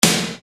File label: Snare Dr; Best Service; Ethnic Journey Sound Cube; EuroDance Essentials; Snares